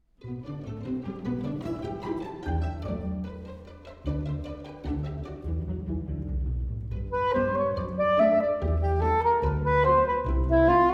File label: saxophone; Etude No. 21 in G Flat Major Op. 25 No. 9 "Butterflys Wings" arr. for saxophone